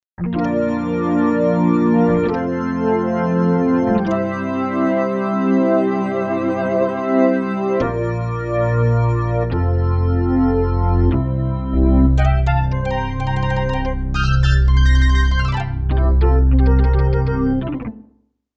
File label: Fine Organ, Roland JV-880; Factory Patches